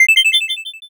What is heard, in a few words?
sparkle